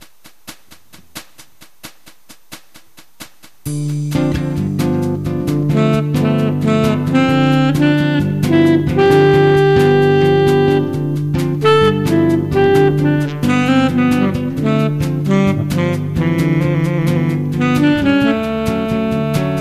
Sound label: wave sax